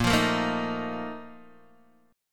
A sharp Major 7th Suspended 4th, Guitar DADGAD

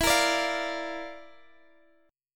Bb Major E; Bb/E strummed; JGuitar

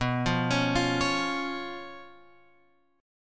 B Suspended 2nd Flat 5th B, chord; Bsus2b5; {7 8 X 6 6 9} arpeggiated

